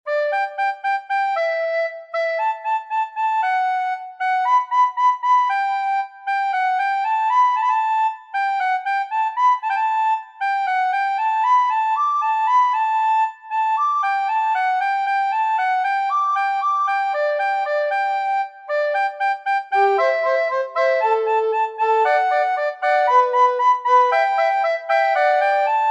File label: canons trio; martini giovanni battista